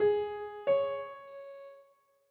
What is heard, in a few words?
piano